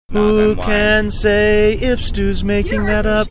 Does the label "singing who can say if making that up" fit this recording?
Yes